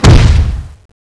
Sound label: footstep, giant titan; zombie